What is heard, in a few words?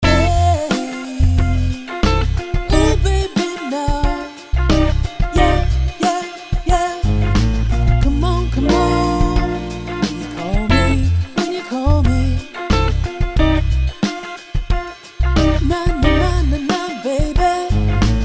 Vocal Phrases